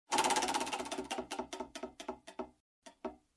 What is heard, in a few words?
loop end; casino